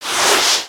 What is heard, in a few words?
Text Outgoing; aronwk collection; ndaudio